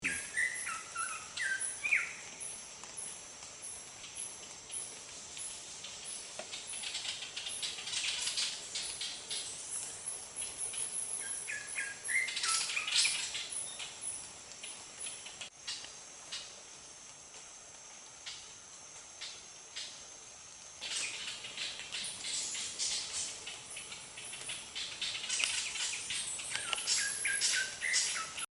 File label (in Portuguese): Canto do black cheeked ant tanager